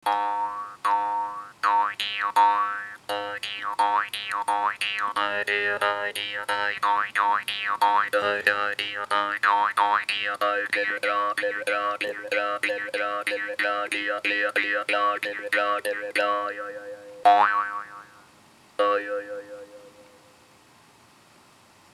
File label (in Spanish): trompa b